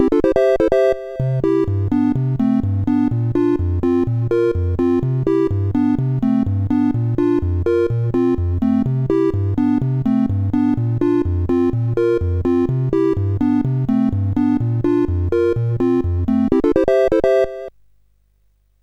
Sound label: tune sid CSG8580R5